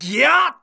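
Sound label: Mon Man ATTACK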